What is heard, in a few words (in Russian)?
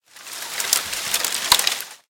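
сбор прошлогодней сухой листвы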